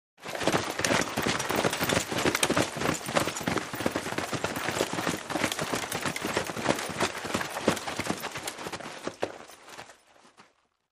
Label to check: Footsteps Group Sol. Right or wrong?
Right